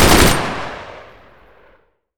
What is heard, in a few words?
machine gun burst